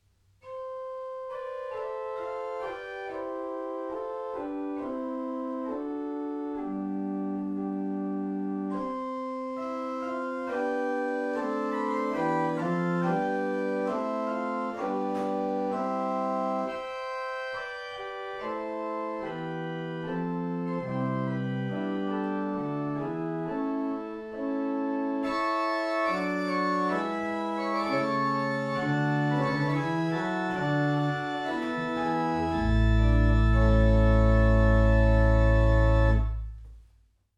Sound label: principals, organ